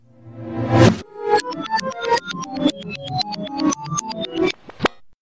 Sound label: startup backwords; gamecube